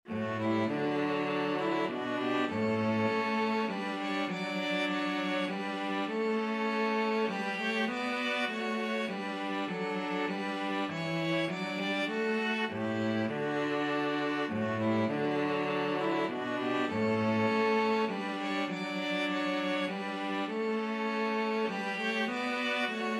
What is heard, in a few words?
string trio